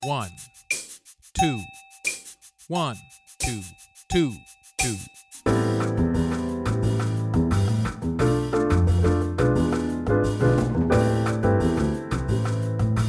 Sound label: Guitar Play a Long LATIN; Jazz-Band; All-State